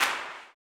CLAP, Blues Soul; Spectrasonics Vocal Planet

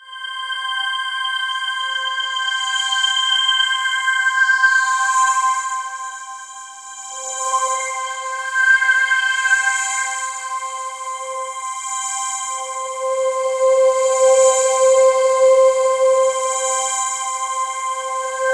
POLY SAW, Roland JV 2080, C5

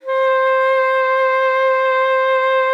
C5, alto sax; Xsample VOL-2

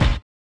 collision; Spectrasonics; Metamorphosis

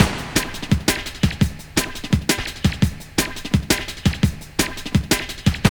LOOP, Tekno Industrial; East West

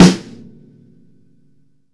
Snare ff, EMU PX-7 drum machine

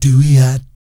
DOOEEOT, Vocal Planet Jazz & FX; ILIO

